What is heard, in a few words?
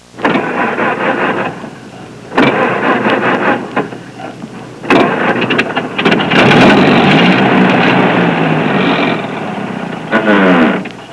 MODEL T, Transportation